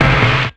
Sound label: Percussive Stab